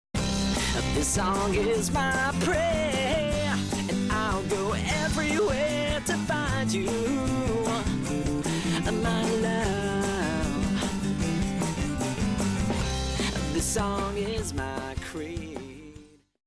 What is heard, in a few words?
my creed brick wall distortion; This is what it sounds like if you push the level just as high as in the previous clip, but use a brick-wall limiter on the signal first; That polished, over-produced, "sellout" subway announcer sound